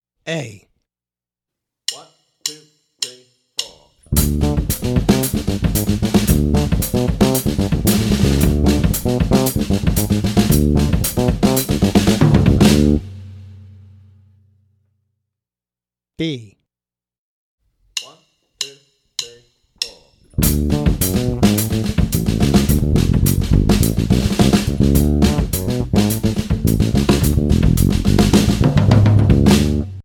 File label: bass style example latin funk